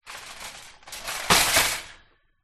shopping cart metal roll push into wall or other object; foley; zapsplat